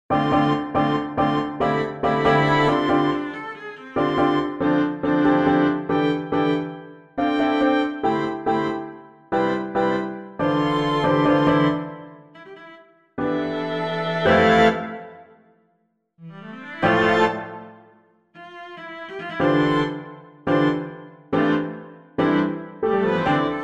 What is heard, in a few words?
trio